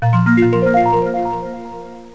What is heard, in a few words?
MUSIC